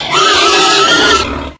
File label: spooky, halloween; Source Engine; tf